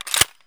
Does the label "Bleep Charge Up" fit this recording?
No